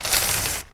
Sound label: carriage close